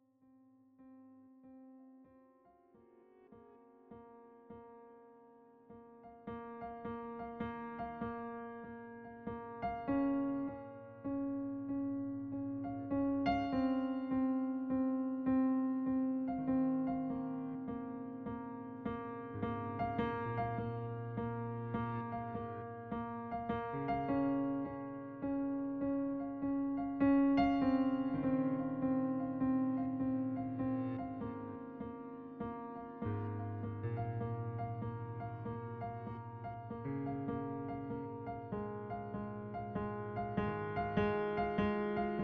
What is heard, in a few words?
PIANO